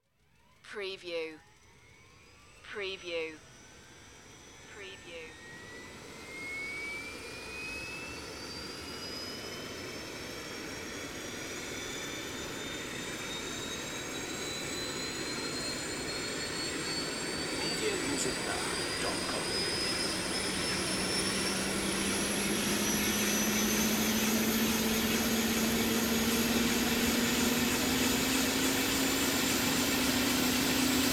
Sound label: Helicopter Close Start Up